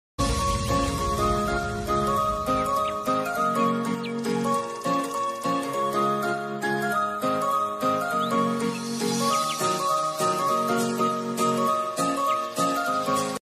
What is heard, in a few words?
Indian Morning Ringtone